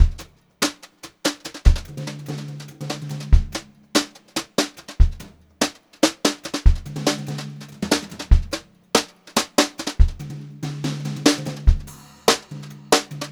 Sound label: Brushed Drum Loops; USB Soundscan vol.39; AKAI